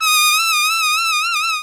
THE TRUMPET

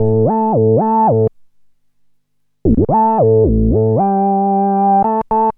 synth, Roots Disco; USB Soundscan vol.32; AKAI

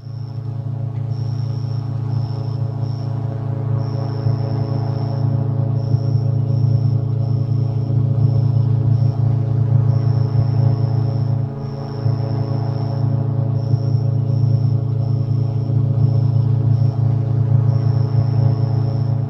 ATMOSF; Best Service; Advanced Mega Effects

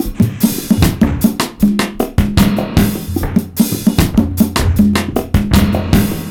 ONE DROP, 76 bpm, Breaks; POTBreaks

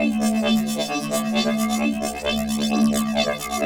RING MD 132, Trance; AMG; Global Trance Mission VOL-2; PROCESEDLPS

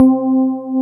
EP C3, Hip Hop; E-MU Formula 4000 Series Vol. 1; Hip Hop Nation

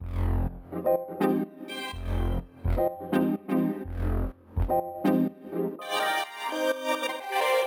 F 125 FX, melo filter loop; Espionage; RoBKTA Sample Pack Supreme

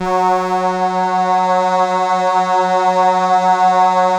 CRUMAR; Masterbits; Vintage Voltage

